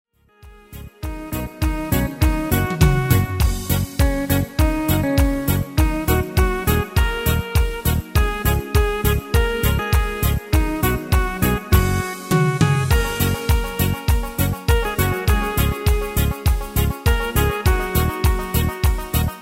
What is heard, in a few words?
Party